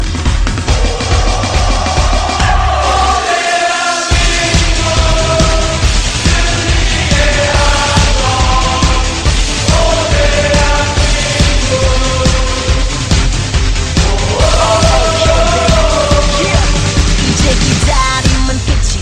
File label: mom cry